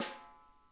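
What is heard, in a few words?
snare edge tap; Sonic Reality; Interactive Drum Kits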